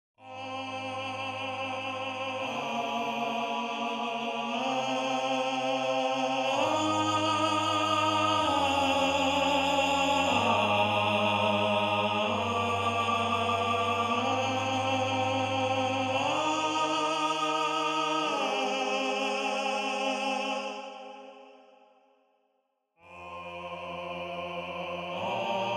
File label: counterpoint